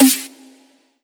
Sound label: electronic G#; osu